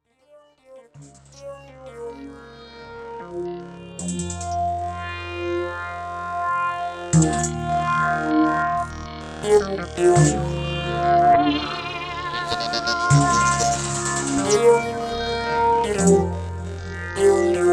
Walking Base; Fizmo

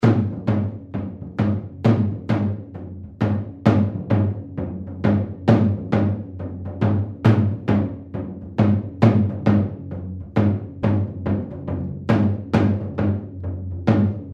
Percussion

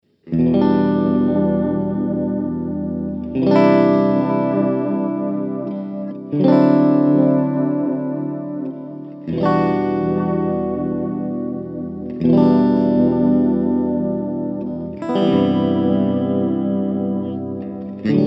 analog mod

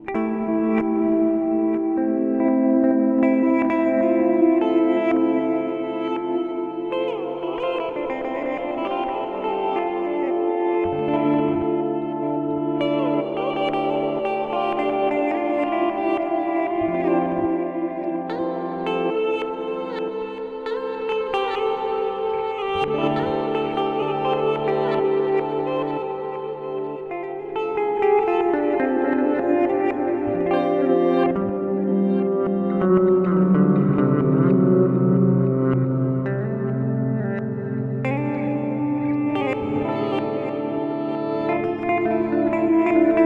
Line 6 HX Effects Reverse Delay Glitz Reverb

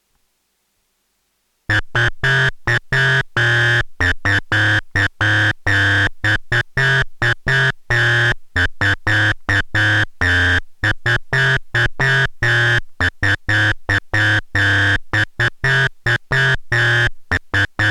Korg Monotron Duo

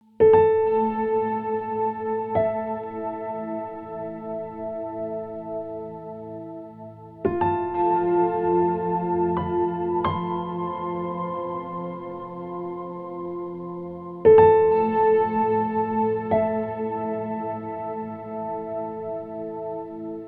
Piano Cathedral Pitch; Mercury X

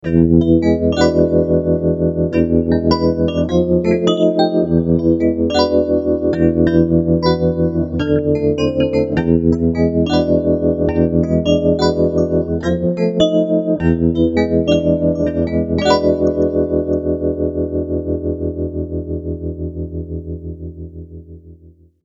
Vibes

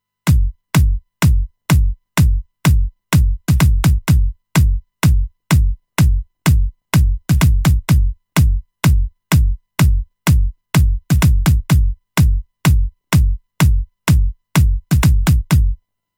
Kick Original

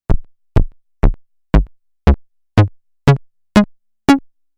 Short Attack

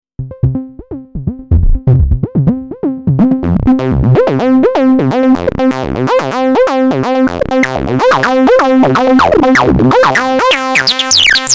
VCF 12 LP 6 HP; Xenophone

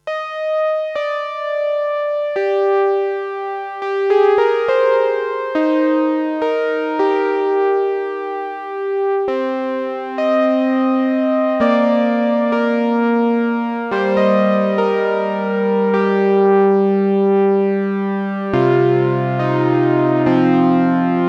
Boss CE-300

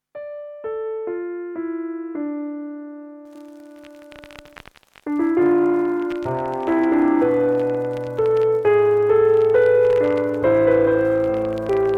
Strymon Time Line Lofi Vinyl Piano

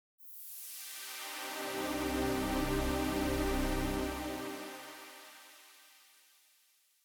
12dB HP Waldorf Filter

